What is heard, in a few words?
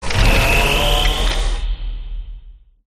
powering up mono; gow; fortifications